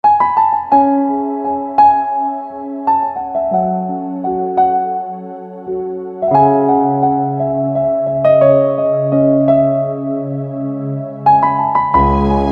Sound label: Piano Instrumental Ringtone; veer zaara